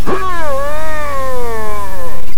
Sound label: death; sigurdrshavoc